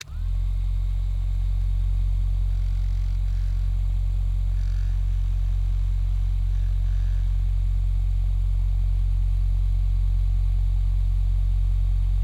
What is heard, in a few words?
massager, osscilations, switch, hispeed, mechanical, electrical, 50Hz, hum, vibration; loopable; Eiravaein Sound